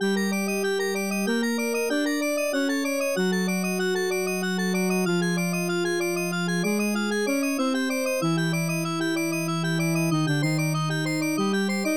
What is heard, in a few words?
OPN FM MIDI